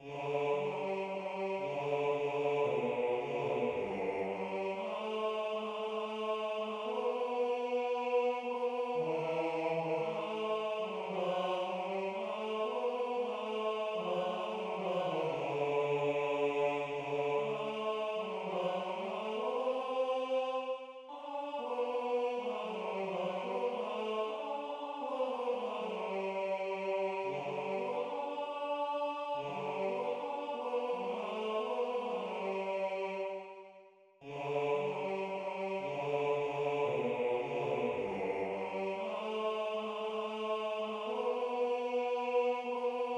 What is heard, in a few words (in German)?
Gesangklang